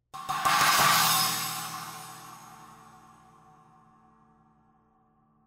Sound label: ZILDJIAN 20 PROJECT 391 CHINA